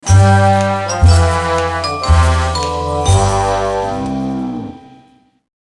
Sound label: lose